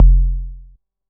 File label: bass 808 dead F; Polyend; ARC Noise